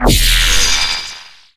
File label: portal fizzle, portalgun; Source Engine; Portal 2